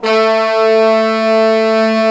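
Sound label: Brass Pop Section; Roland L-CD702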